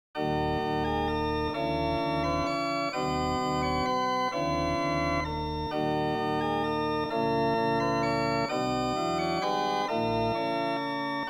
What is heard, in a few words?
Septimal Meantone; de La Mare